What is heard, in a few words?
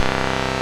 SYN DNC; Sound & Vision - Gigapack II